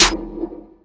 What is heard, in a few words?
Snare; BathroomSnare